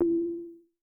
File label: Perc Reso, Vermona Mono15 Drums; Stereo layers